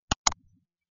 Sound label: mouse click; Prompt: A single mouse click